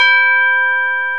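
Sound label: BELL G4, percussion; AKAI S-Series CD-ROM Sound Library VOL-8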